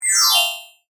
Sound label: Multimedia Chime, Technology, Multimedia, Chime; BlastWave